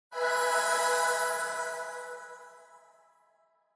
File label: Angel Chime High Short